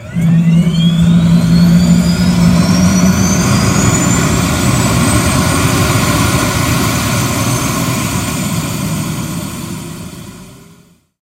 jet engine start cockpit; War Thunder

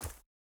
wolf footstep wood hard; Rust

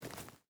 footstep burlap forest walk; Rust

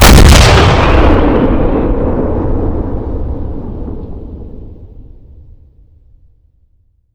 Vehicle Weapons; Red Orchestra 41-45

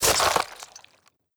flesh; Rust

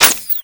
night vision off; Modern Warfare

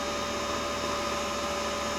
Cockpits Fuel Pump; DCS

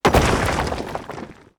objects, World of Tanks

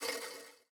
spinner wheel spin accent; Rust

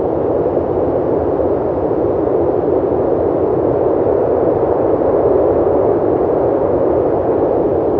engine plane air; War Thunder

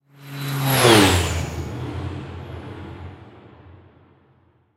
plane passby layer; War Thunder

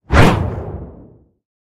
shell whiz

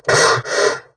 redout short; War Thunder

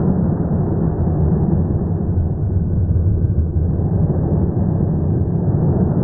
close ground close; War Thunder

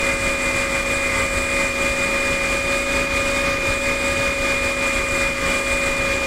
kv220 engine charger loop, tanks; engines; War Thunder

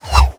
grenade whizzby

